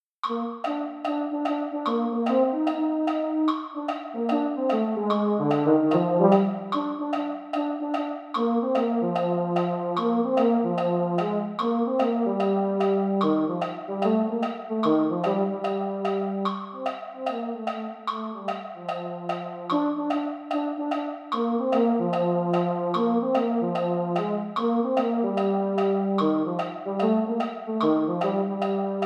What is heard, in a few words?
baritones; holiday brass